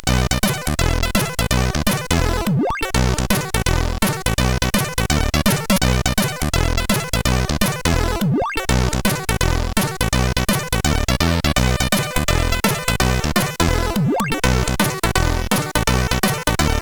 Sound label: CSG 8580R5; HVSC